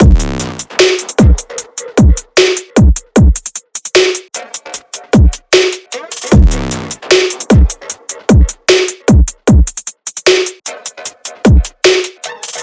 drums 152, Full Drum Loops; Slate; SEISMIC Drum Sample Pack

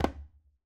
djembe Single Hit Flams; Raw Perc One Shots; Slate; SD SEISMIC Drum Sample Pack

